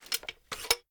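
weapon foley assault rifle grenade launcher reload shell load; weapons; gmod; viper; scharlie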